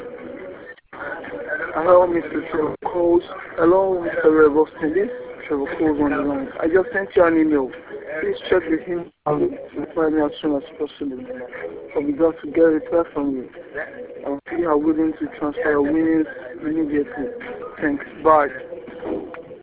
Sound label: lead